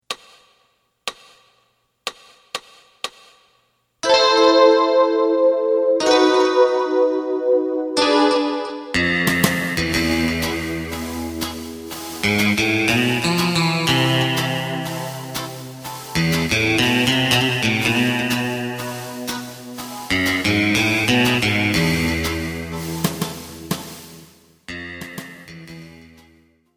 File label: bass